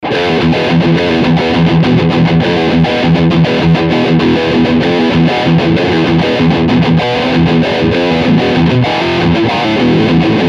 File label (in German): Riff Distortion Steg PU